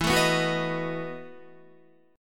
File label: E Major 7th Suspended 2nd Suspended 4th E, Guitar; EM7sus2sus4 strummed